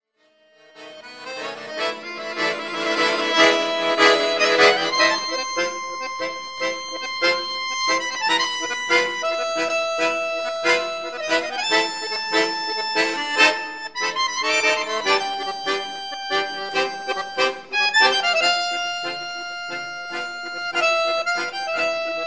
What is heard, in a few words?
tango